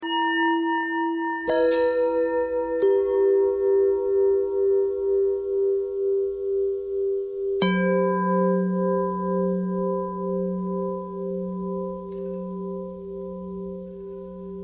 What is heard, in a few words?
long bell bell thread pentatonic, bells